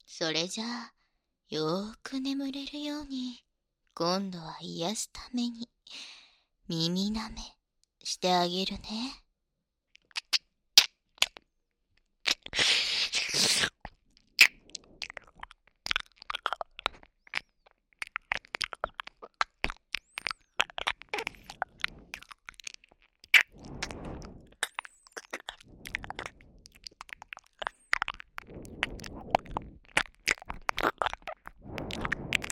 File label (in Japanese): 癒しの耳舐め寝かしつけ